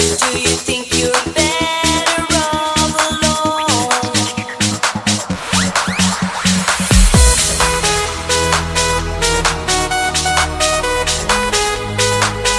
130bpm B

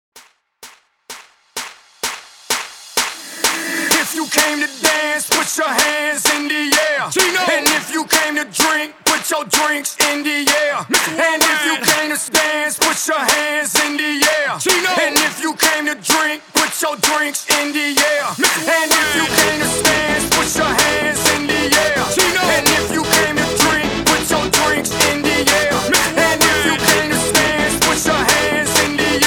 Party Starter 128 BPM; FREEJAK

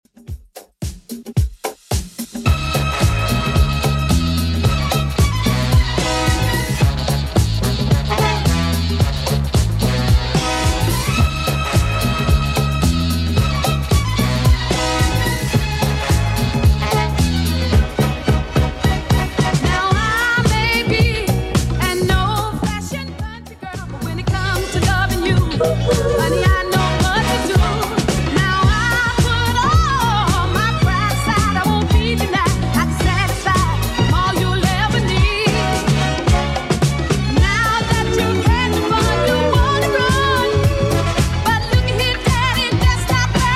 80s Redrum 110bpm

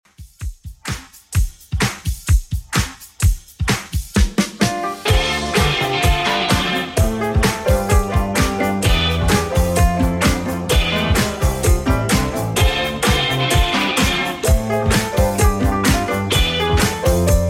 80s Rock Redrum 128bpm

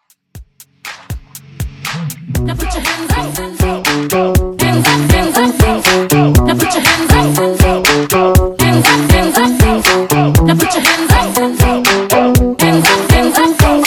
Party Break Mixshow Hype Intro Outro 120 BPM

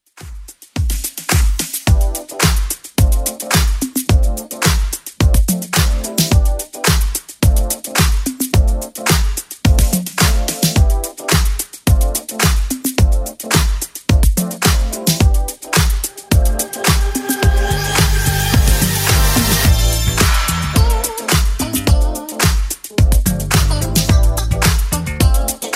80s Club Edit 108bpm

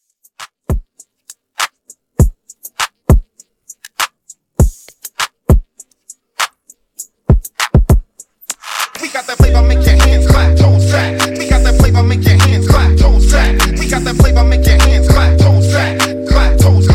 Redrum

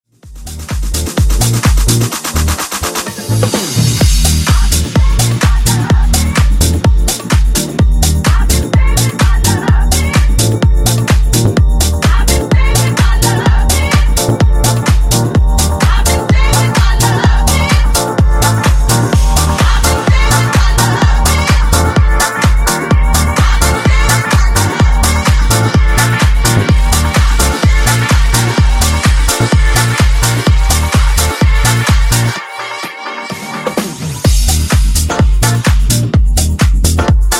Disko Funk Remix 127 Bpm

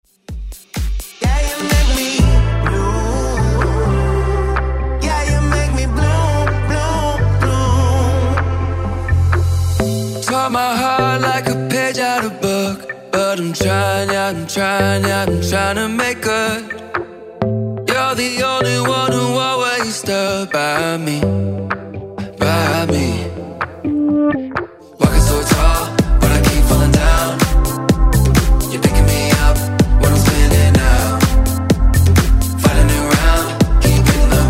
Top40 Redrum

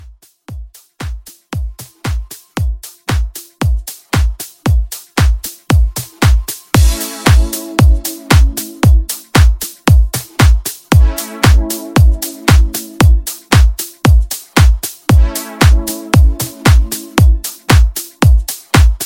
house, 115; 2024 House Re-Drum

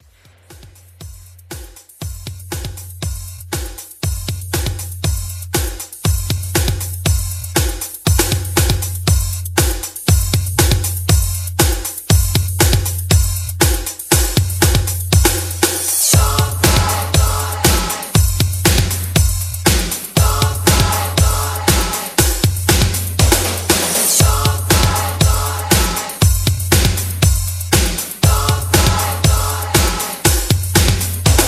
80s Soul Re Drum 119 BPM; Quick Hype

